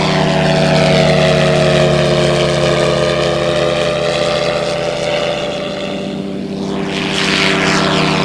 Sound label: drone; quake2